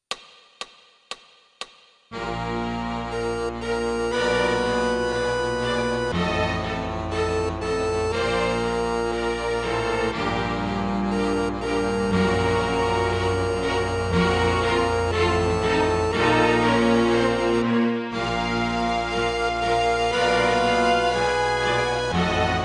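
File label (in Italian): Marcia triste, Fisarmonica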